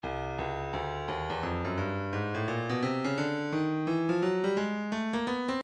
breakbeat midi files 085 089 bpm; swagga c; Stylus Breakbeat Midi Files

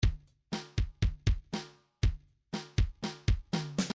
midi fills rock

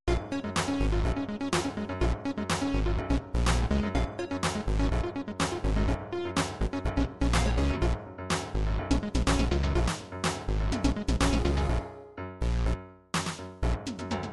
synthpop, midi

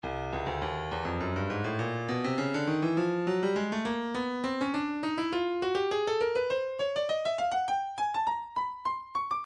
breakbeat midi, 102 bpm; cruz d; Stylus Breakbeat Midi Files

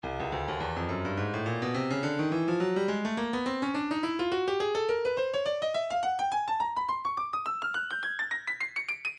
breakbeat midi, 105 bpm; headbobber b; Stylus Breakbeat Midi Files

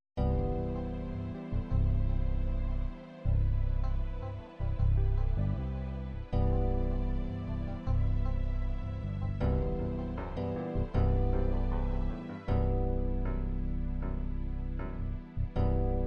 ballad

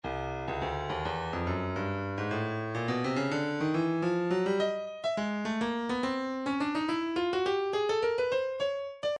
Percussion Bongos, midi; Stylus Percussion Midi Files Bongos 105

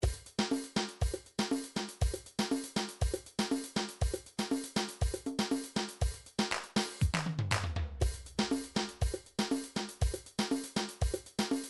drum malfouf; Drum Midi Files Collection

